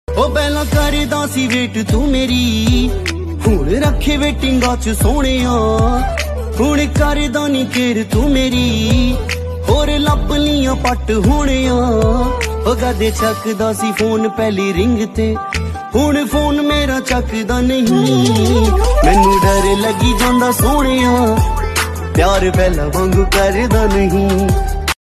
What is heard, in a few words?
punjabi romantic song ringtone